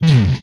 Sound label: Explosion, retro arcade; MMRetroArcadeSoundsPack